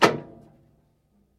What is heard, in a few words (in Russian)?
zakritaya metallicheskaya zashelka